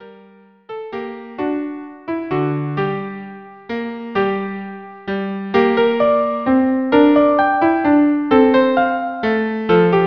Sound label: violin viola; Greensleeves